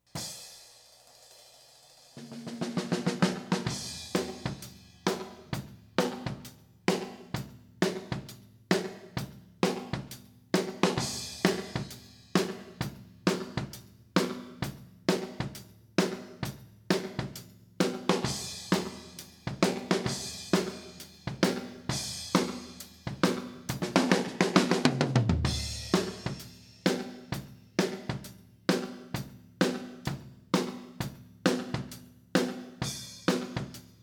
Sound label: Carolina Blues, drum track